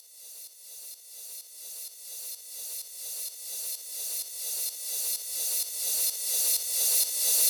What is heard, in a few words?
FX Uplifter